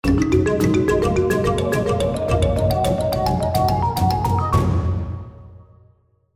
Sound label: musical spin; Shamans Dream 2; reel